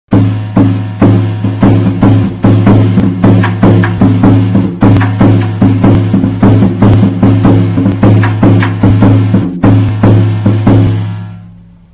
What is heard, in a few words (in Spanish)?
tamboril; tradiberia